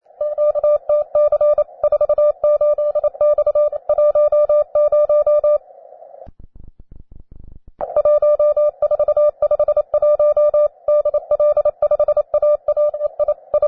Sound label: cw, beginner; Training CW QTCs (Beginner); QTC list